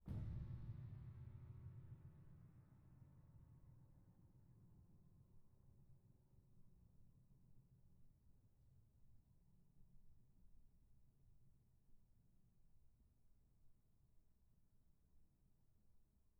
pedal D, bitKlavierGrand Binaural

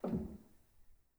rel, Grand

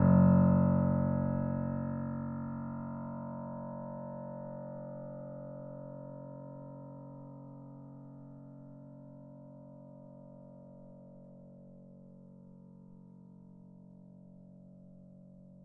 F#1, bitKlavier Grand Binaural

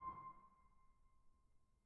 harm C6, bitKlavierGrand Binaural

